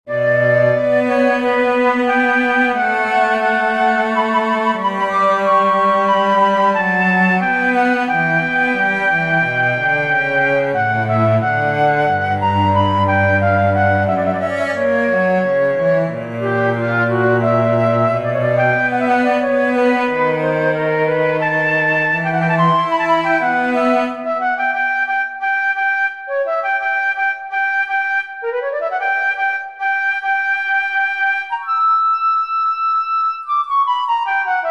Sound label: recitativo for flute cello; bach johann sebastian; mein herz soll bewahren